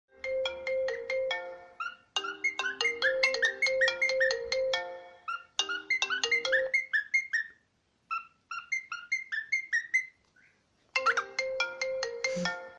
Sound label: cockatiel iphone mobile ringtone